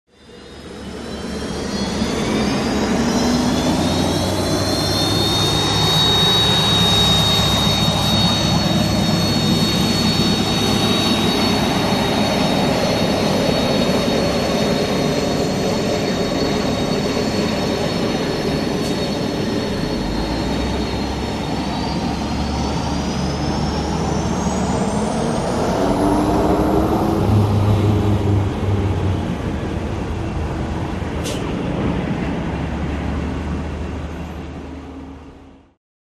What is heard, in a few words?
Hydroplane Away Amb